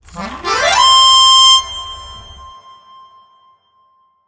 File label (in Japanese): ピアニカ(駆け上がり)